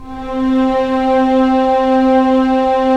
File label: STR, Roland LA Composer